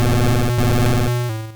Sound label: Egg Bomb; sfx; attack moves; pokemon; gen 1; rby